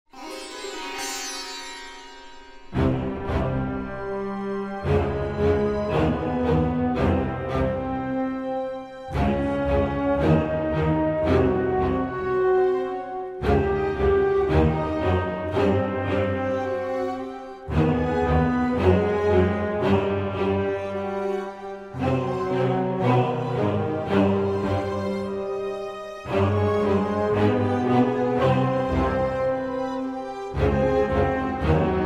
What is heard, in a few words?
Music; Age of Empires III; cinematics